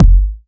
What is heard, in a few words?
deep kick drum f sharp key; Bitdepth 24